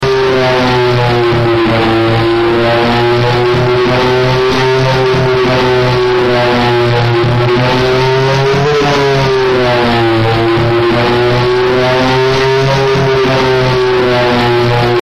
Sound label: Electrical Distortion; Various Intensities.